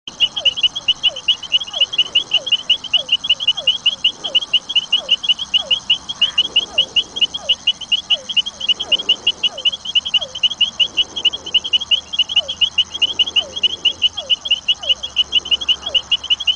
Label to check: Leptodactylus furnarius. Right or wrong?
Right